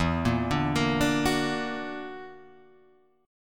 Gb Augmented 7th E

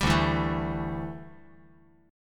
D Suspended 2nd Suspended 4th D down

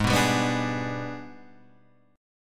Minor Major 7th Flat 5th; G#mM7b5 Chord {4 2 5 4 3 4} strummed

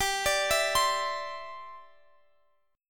E Minor 7th Sharp 5th G

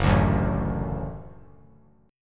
Gb Minor Sharp 5th Gb; strummed; JGuitar

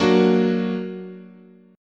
F sharp Augmented, chord, guitar

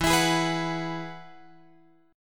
E Major Add 9th

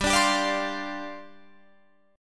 G 7th Suspended 4th G down Guitar Open F; Listen to G7sus4 strummed; G7sus4 Chord

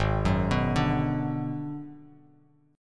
Ab Minor 7th Flat 5th, Bass Standard; arpeggiated; JGuitar

